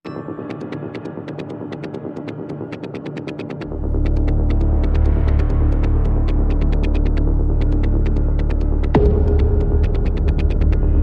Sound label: No Orchestra